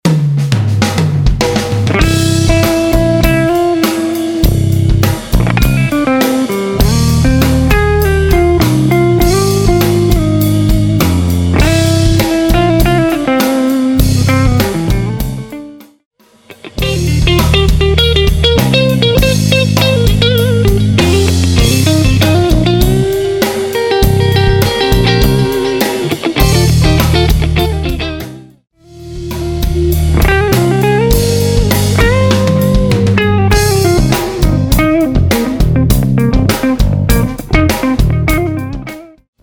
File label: Peavey Classic 50 clean